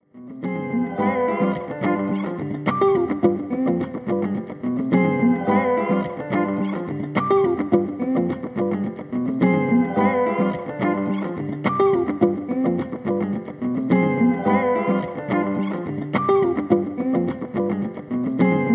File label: ringtone